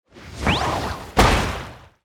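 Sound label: attack; hero sound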